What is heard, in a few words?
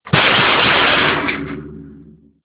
metall door bang